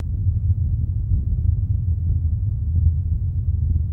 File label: Volcano Rumble Loop